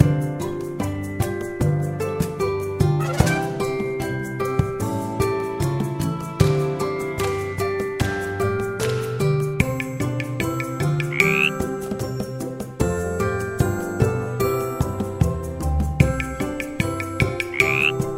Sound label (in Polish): wersja wokalna